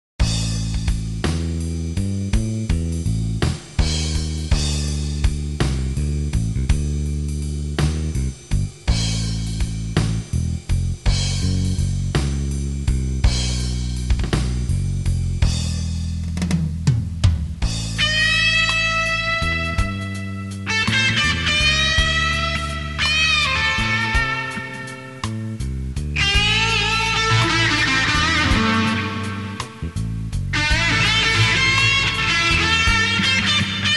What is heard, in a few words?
Bluesy